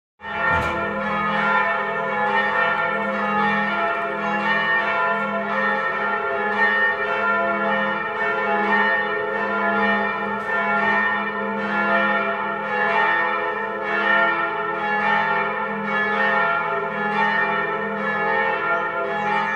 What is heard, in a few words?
Bells